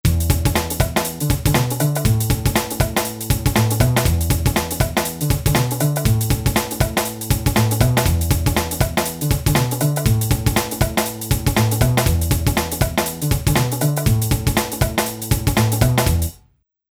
Rap Hip Hop

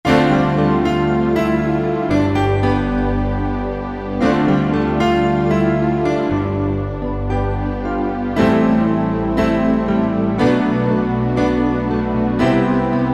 Layer Piano, Korg 05RW

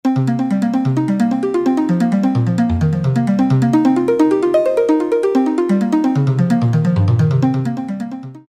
arp Plucked 5ths, E-mu PK-6

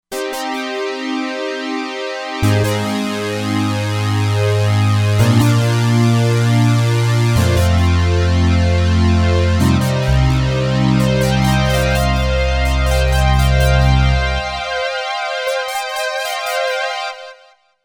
Ribbon Slide

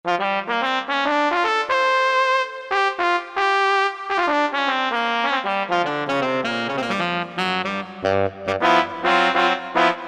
Sax-Pet; Korg MSC-1S Variety

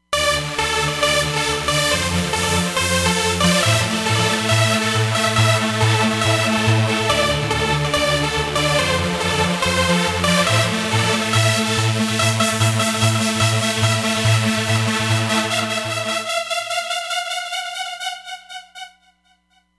Roland Fantom XR; Bustranza

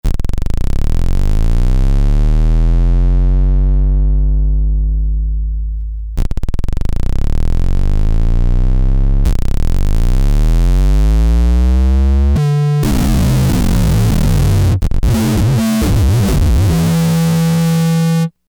Rip, Novation XioSynth 25

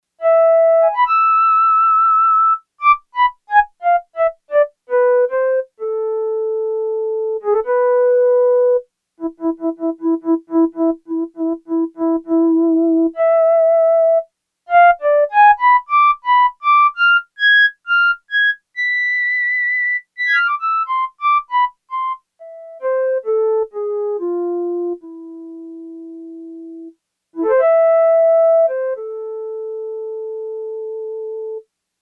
Flute, Yamaha TX802; Factory Patches; Voices

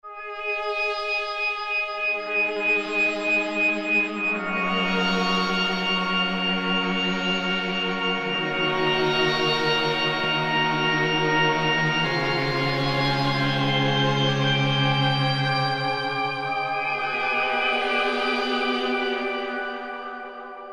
Korg microKORG Shadow Pad; Factory Voices

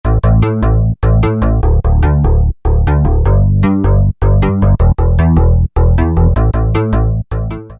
bass FM Bass; E-mu Orbit V2; Factory presets

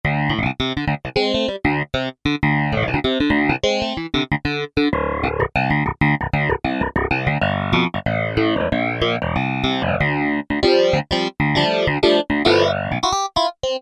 Poppin Clav

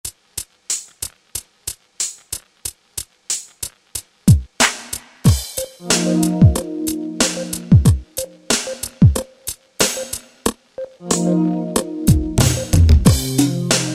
Trip Hop, Yamaha DJX Styles

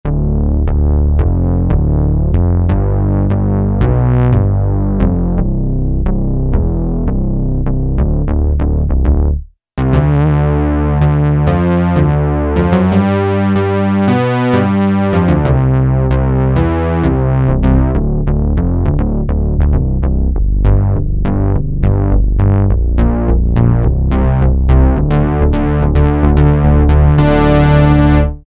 Ensoniq ESQ-1 SYNBAZ

